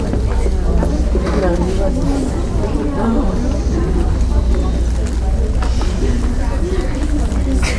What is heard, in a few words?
cinema, musics